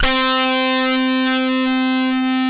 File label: Dirty Lead, DISTORTION; Sound & Vision; Gigapack I CD 2; GUI E-DISTORTION